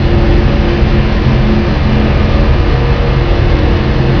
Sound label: big machine with cues; ambience; quakemapping; mod xj18